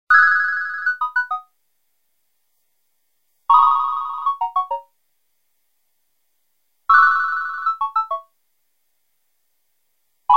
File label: Nokia Tones; Springtime; Windows Phone apps